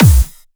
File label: Clubby Kicks, Bassdrums